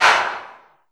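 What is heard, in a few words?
large room 909, reverb; TR 909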